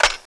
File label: reload clip out, weapons; tfa cso